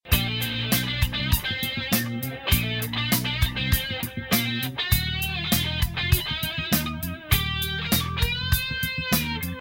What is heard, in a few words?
Instrumental